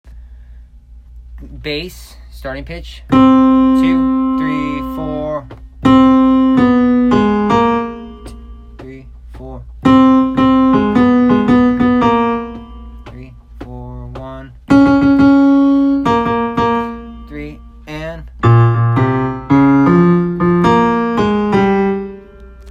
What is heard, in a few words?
young bass